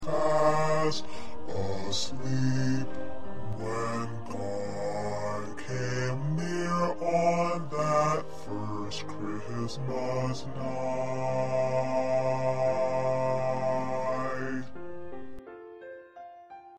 1st Bass

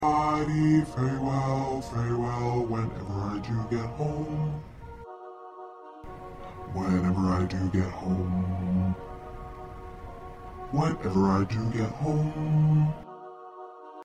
Bass 2

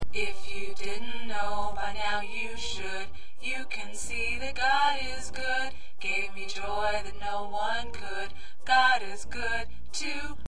Tenor, choir

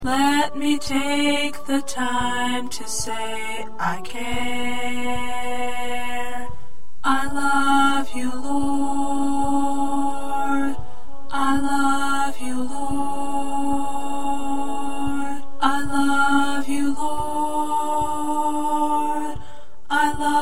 1st Tenor; gospel songs; PRACTICE TRACKS

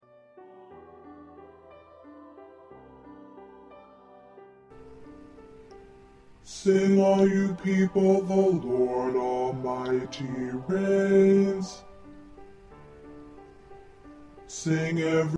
Bass

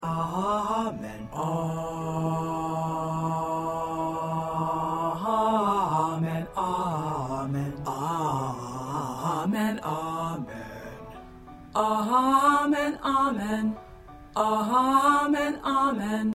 Bass

